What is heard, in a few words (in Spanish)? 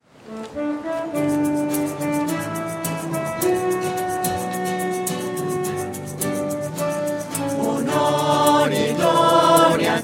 voces; misas 2012